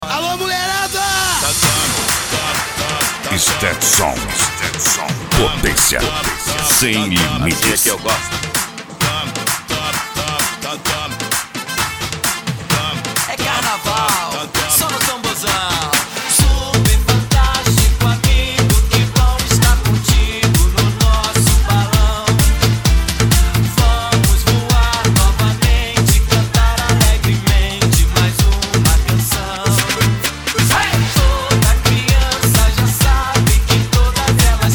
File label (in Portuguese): sound car carnaval